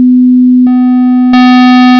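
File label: sine saw square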